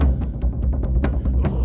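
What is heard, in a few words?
Music Shield; from dust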